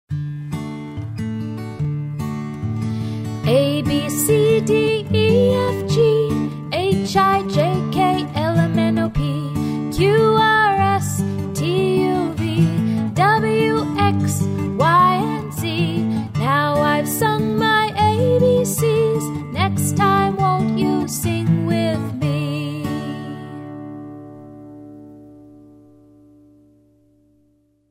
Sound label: alphabet song